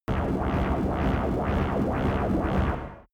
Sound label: space above flying hover heavy loaded; raumschiff